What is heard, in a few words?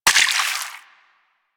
Water Sprayer Surface Impact; Warframe